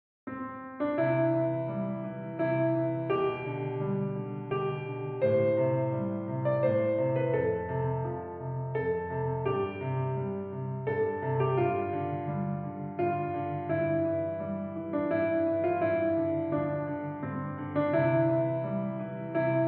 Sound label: slow Scottish waltz, piano